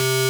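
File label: DISTORTED SYNTH C-3; AKAI S-Series CD-ROM Sound Library VOL-7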